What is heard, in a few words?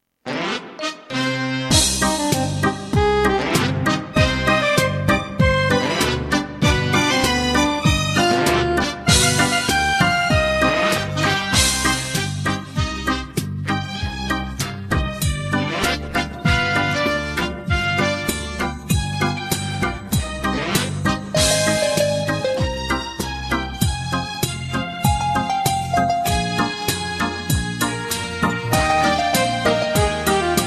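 backing track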